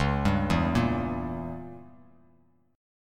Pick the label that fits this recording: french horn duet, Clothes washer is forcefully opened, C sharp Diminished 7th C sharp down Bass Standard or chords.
C sharp Diminished 7th C sharp down Bass Standard